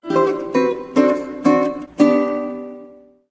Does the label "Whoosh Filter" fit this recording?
No